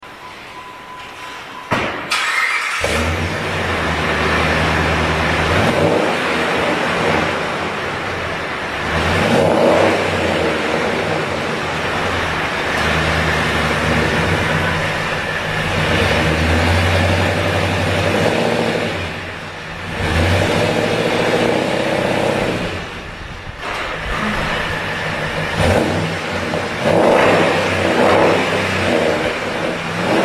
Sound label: Dodge1500 Hemi Duals, custom exhaust systems; S-Turbo